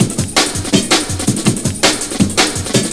Warning Compound; Jungle; Breaks; Blu Mar Ten - Jungle Jungle - 1989 to 1999 Samplepack

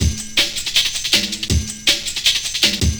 Night Break; Jungle; Breaks; Blu Mar Ten - Jungle Jungle - 1989 to 1999 Samplepack

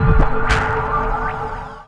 Recharge Hit; Jungle; Blu Mar Ten - Jungle Jungle - 1989 to 1999 Samplepack

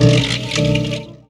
Flutes Rhodes; Jungle; Blu Mar Ten - Jungle Jungle - 1989 to 1999 Samplepack

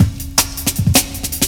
Solar Break, Breaks, Jungle; Blu Mar Ten - Jungle Jungle - 1989 to 1999 Samplepack

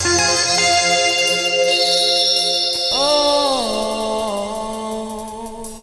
Hits; Jungle; Riffs, Arps & Hits; Blu Mar Ten; Jungle - 1989 to 1999

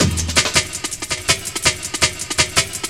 Killa Break; Jungle; Breaks; Blu Mar Ten - Jungle Jungle - 1989 to 1999 Samplepack

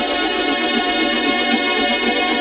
Your Pad - 10A; Jungle; Pads; Blu Mar Ten; Jungle - 1989 to 1999

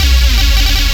Effect; Jungle; Blu Mar Ten - Jungle Jungle - 1989 to 1999 Samplepack

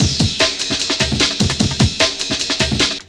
Drumz Compound, 11A, Jungle Breaks; Blu Mar Ten - Jungle Jungle - 1989 to 1999 Samplepack

